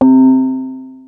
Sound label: Electroflow C3, Synths; Multisamples; Ableton Live 12 Lite; Core Library